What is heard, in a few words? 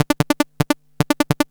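synth blip; X-Static Goldmine 1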